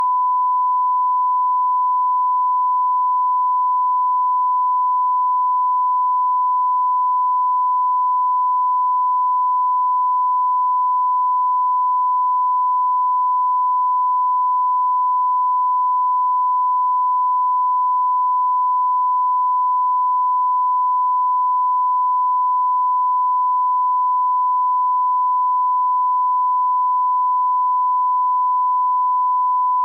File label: 1kHz